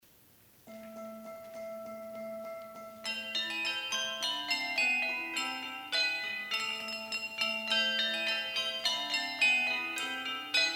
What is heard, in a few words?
Fum, Fum, Fum, Handbell Quartet